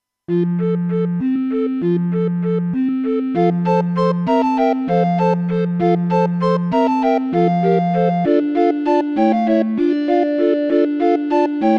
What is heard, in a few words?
Music Box